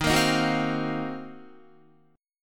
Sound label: Eb Minor 6th Add 9th Eb down Guitar 4E,4A,5D,5G,5C,6F; strummed